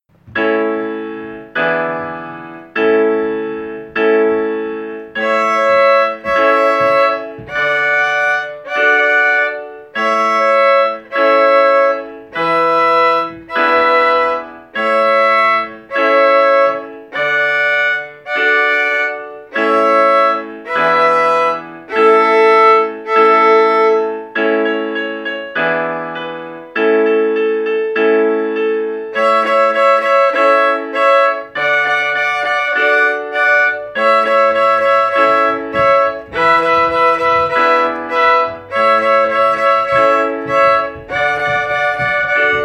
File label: bile them cabbages with violin